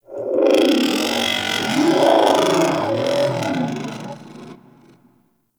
metallic creature growl; Warframe; Tau

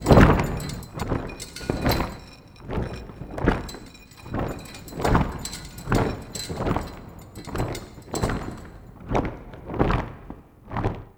Prop Flag Flaps; Warframe; Clan Dojo; Dagath

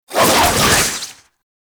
Boss Grapple Travel; Enemies; Abilities; Warframe; Oraxia

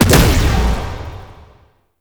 Alt Fire Explosion, Weapons; Warframe; Corpus; Tenet Quanta

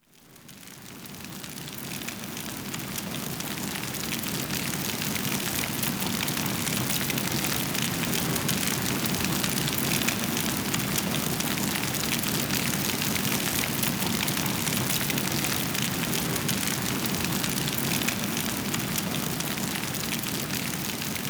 War Room Fire; Warframe; Cinematics; The New War; Lua War Room

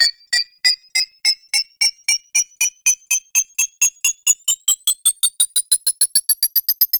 Lancer Frenzy Beeping; Warframe; Tau; Prime Grineer

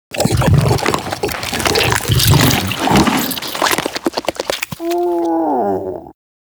Truffle Eat; Warframe; Infested; Juggernaut; Truffle Hunt

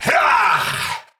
Attack Effort, Battle; en; Tau; Tau Dax Knight

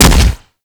Impact Flesh; Furax; Impacts; Weapons

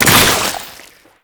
Infested Arm Cannon Mech; Weapons

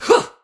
Attack Effort; Warframe; Tau; Dax; Ninja

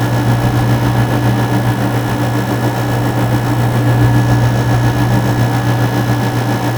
Machinery Air Loop; Props; Warframe; Tau; Ambience

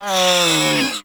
Feral Vocal Death B; Warframe; Catbrow

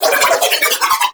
Nunchaku Prime Quick Spin Whoosh; Warframe; Tenno

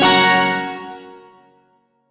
Shawzin Yo Chord I; Warframe; Duviri

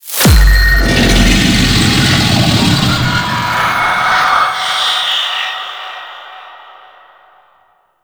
Disruption; Sentient Oplink Murex

